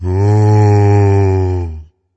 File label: zombie idle